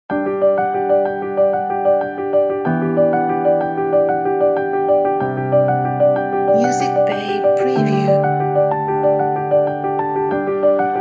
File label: Soft Gentle Piano